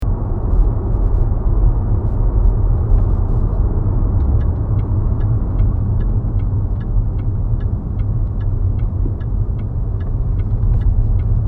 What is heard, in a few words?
rotating grinding noise only right turns, xk right turn